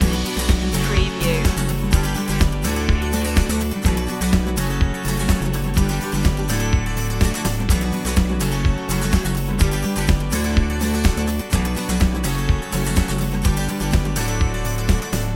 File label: inspirational acoustic guitar loop